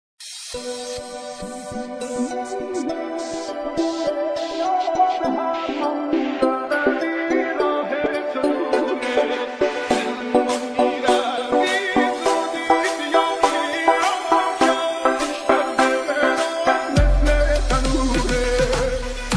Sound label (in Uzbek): gudok uchun maxsus arab xit juda yoqimli; gudok uchun qungiroq